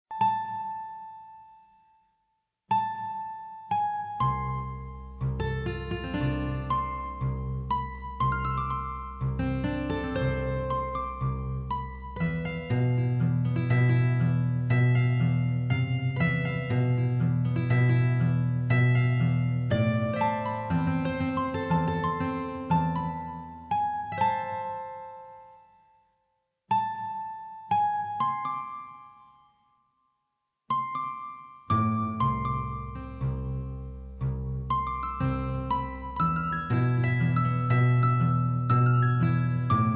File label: Guitar